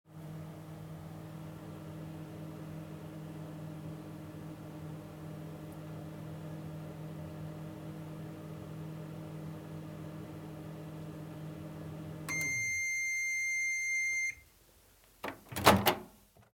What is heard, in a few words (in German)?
Kueche Microwelle